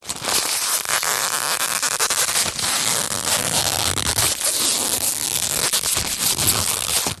foley plastic stiff packet small handling squeezing